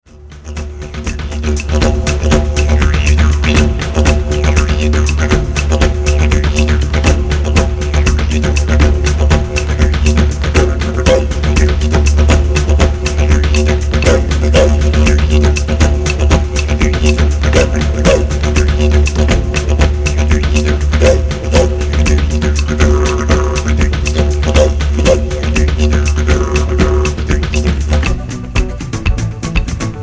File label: Toba Didge